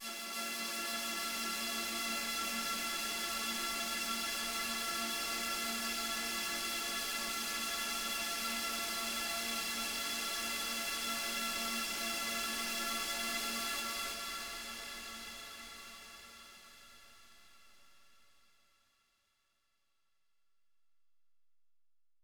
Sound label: flora pad